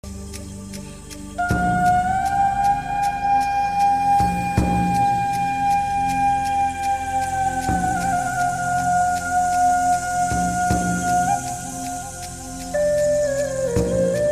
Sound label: Indian Music